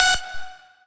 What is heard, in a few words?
lead